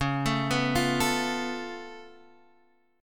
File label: Db Augmented 7th Db down Guitar Drop D